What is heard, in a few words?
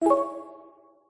notification